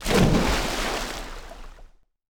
splash Player; HeavenStudioPlus; splashdown

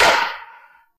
hit; Spaceball

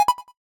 result message; HeavenStudioPlus; rankings

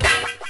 hit; Karate Man

